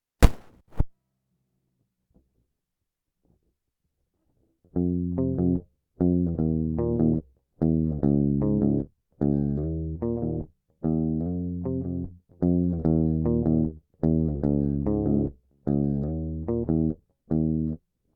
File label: Lead